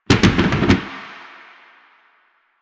Slide Boost Backfire, Motorcycle; Motorcycle Ninety Nine

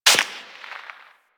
Bullet Whizzby; Warframe; Weapons

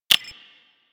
Console Hack Button Press; Warframe

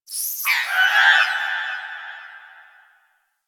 Sentient Lair Exterior Creature; Warframe; Ambience

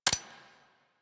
Fire Mech; Warframe; Vipers

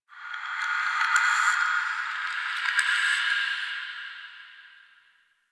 Metal Whispers; Ambience; Warframe; The New War; Quests; Zariman

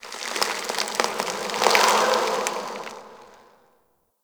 Root Creak, Root Cave; Ambience; Neathuns Root Cave; One Offs

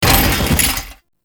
Bodyfall; Foley

